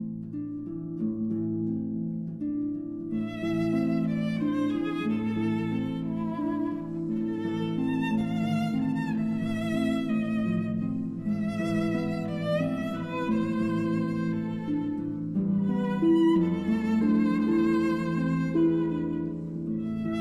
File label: Berceuse, for violin and harp